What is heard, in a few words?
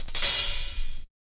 Hat Roll; DRUMS (SINGLE HITS); Hats